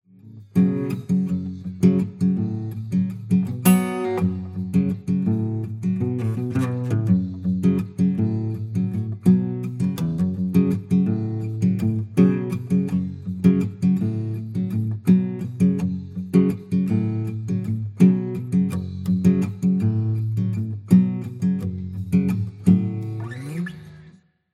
acoustic processed

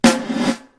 reverse

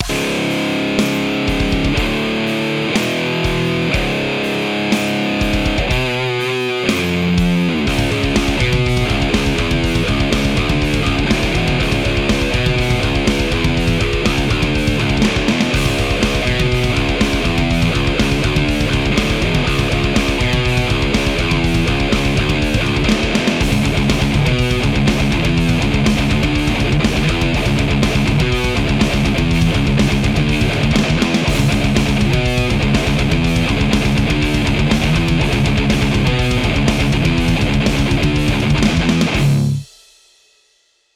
guitar mono; doubletrack gtrs

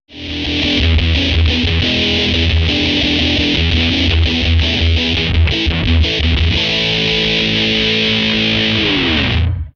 big muff

